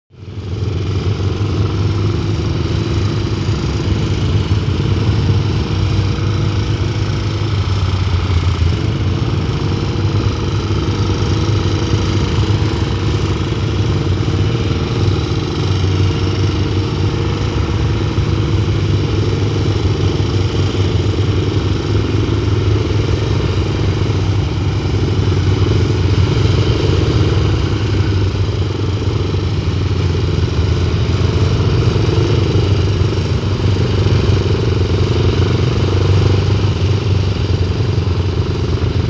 Constant Eight Motor, Motorcycle; By; Four Wheeled Motorbike St, biker group; Four Wheeled Motorbike Start Up And Away To Long Shot.